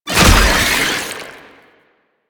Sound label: Proto Infested Babau Passive Tentacle Spawn, Enemies